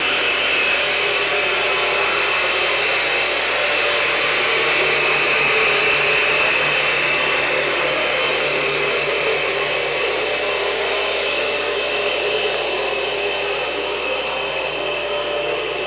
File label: OrcaLab